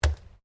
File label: ORGANIC PUNCH WET; Various Organic Foley sounds; Combat Swing Sound Effects; Combat Swings (Sound Effects:Combat)